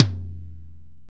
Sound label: ROTO3 C3, TOMS; Greytsounds VOL-1; SDX TOMS